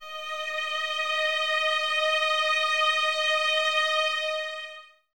D#5, String Ensembles Violins; Miroslav Vitous; 11 Violins

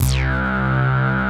303 stab; Pro-Rec - Synthsonic

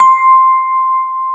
JV SENSITIVE, JV-1080, PIANO; Syntec; Wall of Sounds

